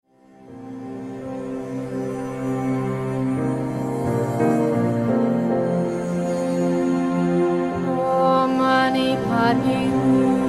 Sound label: Om Mani Padme Hum